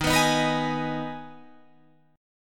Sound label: D sharp Suspended 4th Sharp 5th D sharp, Guitar Drop D; chord shape